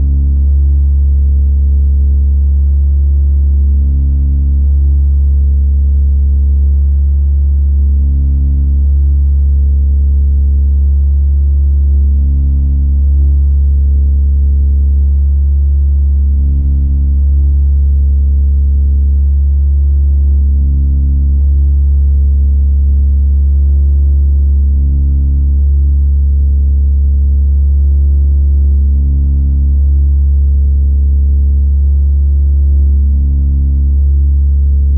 no input feedback loop deep low steady slightly fuzzy; sonniss gdc